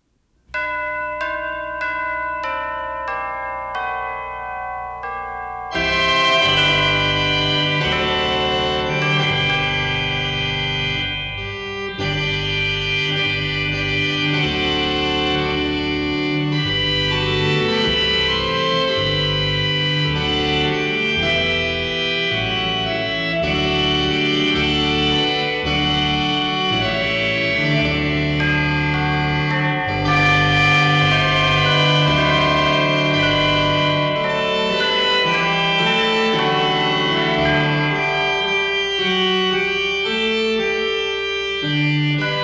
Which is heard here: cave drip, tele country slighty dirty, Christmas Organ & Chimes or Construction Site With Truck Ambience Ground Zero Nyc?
Christmas Organ & Chimes